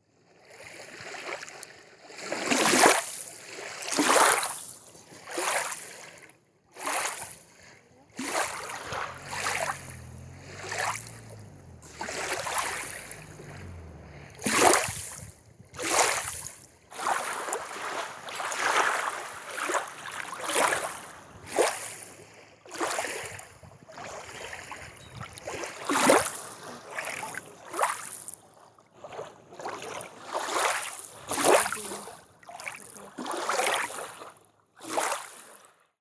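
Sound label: lake waves close-up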